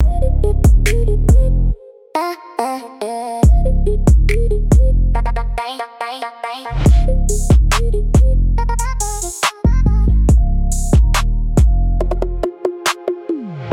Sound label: loop; bgm